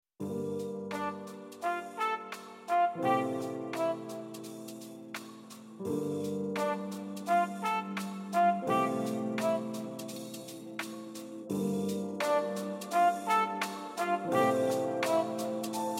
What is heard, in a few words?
instrumental lofi